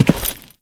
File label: Step Default, Footsteps